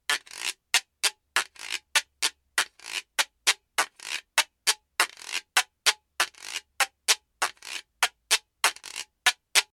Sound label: guiro